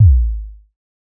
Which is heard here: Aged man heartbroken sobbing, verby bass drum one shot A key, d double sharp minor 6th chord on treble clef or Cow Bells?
verby bass drum one shot A key